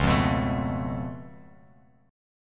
C sharp Augmented 7th C sharp down Bass Standard, chord; C#+7; strummed; JGuitar